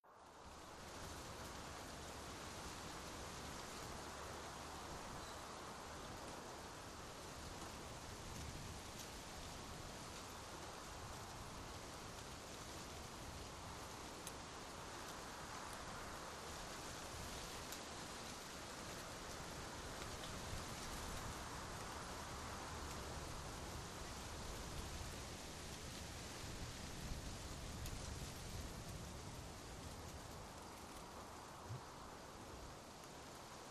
Dry Summer Forest Wind, Light Wind; Steady In Trees